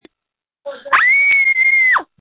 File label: Scream